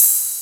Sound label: Rides, Cymbals